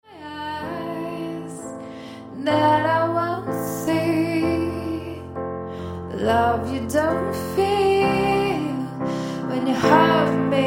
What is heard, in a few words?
female vocal and piano